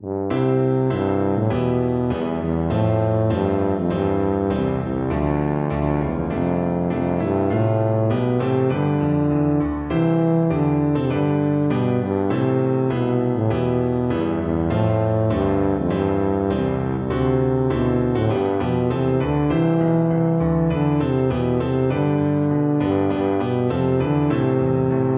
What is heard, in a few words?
national anthem russia, tuba